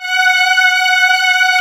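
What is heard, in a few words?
symphony strings F#3; Back In Time Records; Korg Universe VOL-1; P3+SYMPHONY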